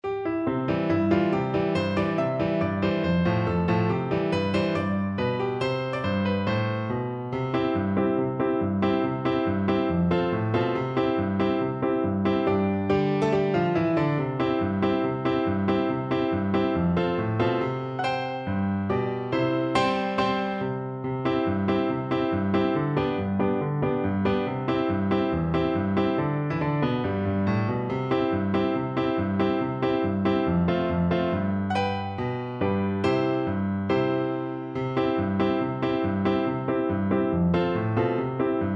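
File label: recorder karaoke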